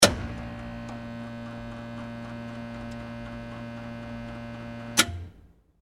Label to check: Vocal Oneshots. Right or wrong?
Wrong